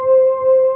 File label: PAD